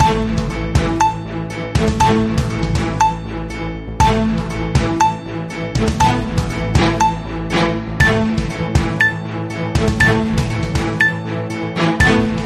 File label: Modern Sound of News; bbc